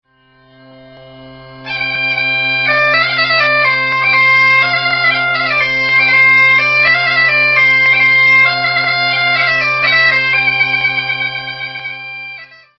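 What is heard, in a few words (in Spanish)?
gaita gallega; tradiberia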